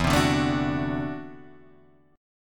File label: D 9th E, guitar; strummed; JGuitar